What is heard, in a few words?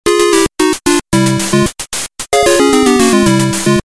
start game; Scratch; Duck Hunt